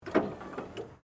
CAR MAZDA RX7 SERIES3 EXTERIOR LIGHTS UP; 1983; Cars; Vehicles; SoundEffects-Foundation1

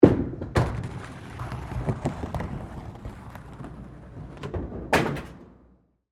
Bowling Gutter, Ball, Follow; Sports; BlastWave

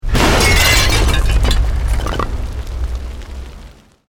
Explosion Medium Brick Wood Glass

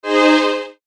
Rollover Swell, Fast, Buzz, Swell, Short; Mouseover; Multimedia; Production Element, Imaging Element, Accent, Transition; Blastwave

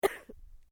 Female cough weak; Female vocal sounds; Clearing Throat; Human

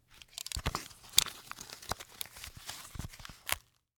Foley, Sun, Glasses, Insert, Into, Case; Dressing; BlastWave

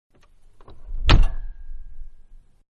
Mid Size Car Door Close Exterior; Cars (Sound Effects:Vehicles); Car Mid-Size-Door Close-Exterior

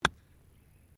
Golf Swing Wedge Fairway; Sports; Various Golf sounds

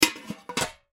Paint Can Lid Set Down; Set Downs; Industry; Objects; Blastwave

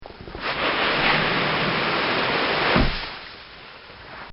Filling Oat Cart

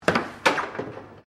Air Hockey Goal, Air Hockey table; Games; SoundEffects-Foundation1

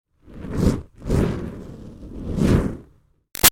Fire Torch Whoosh Med Moves; Flame Sound Effects; Objects; Big Room Sound

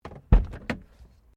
CAR SUBARU LIBERTY INTERIOR DOOR OPEN; Family members Subaru; Vehicles; SoundEffects-Foundation1

